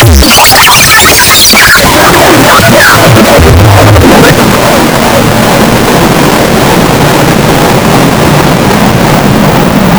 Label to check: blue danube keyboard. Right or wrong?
Wrong